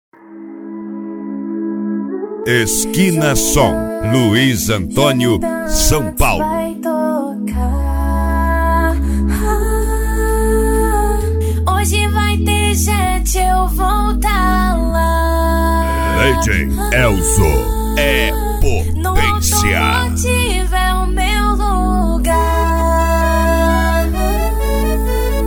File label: mega funk; skina som; luiz antonio sp